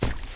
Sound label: Big Mud Man walk; Seer; GamigoNA